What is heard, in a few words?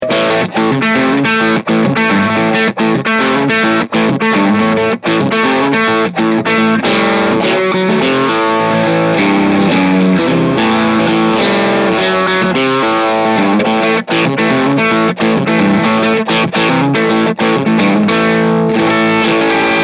Boogie